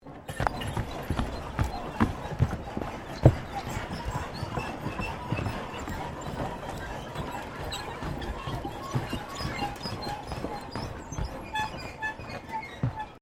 Footsteps Boots Suspension Bridge Wood Wire Run Sequence; Footstep Foley; Human